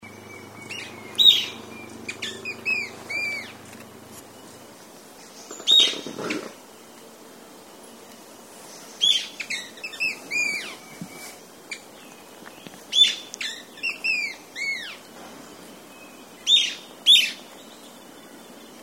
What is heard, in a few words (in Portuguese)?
canto do arapacu escamoso